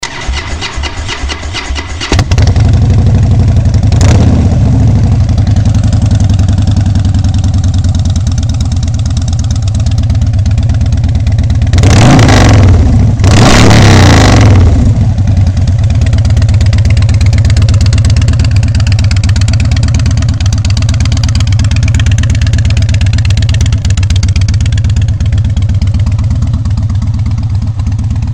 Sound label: Harley-Davidson Wide Glide with Samson Legend Boloney Cuts